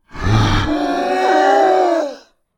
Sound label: animal dinosuar vocalisation growl; zapsplat